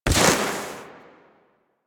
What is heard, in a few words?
Step Snow